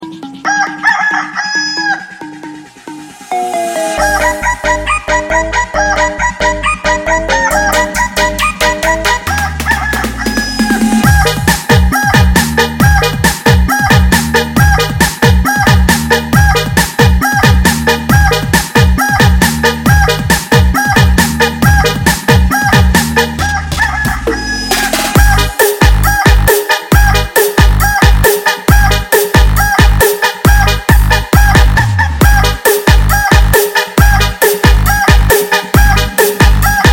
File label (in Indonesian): nada dering remix tiktok, ringtone